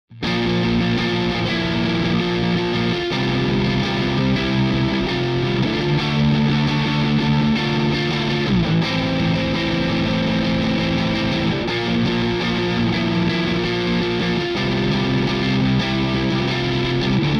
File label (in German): Gitarre